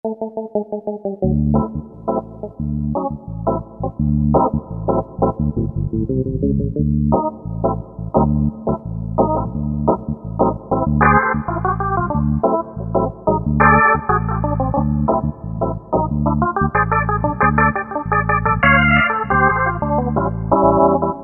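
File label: Jazz2 Tremolo, Korg MSC-9S Organ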